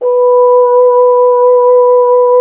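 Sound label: BRS F.HRN, Solo Instruments, French Horn; Miroslav Vitous; Solo Instruments VOL-2; Solo lnst. II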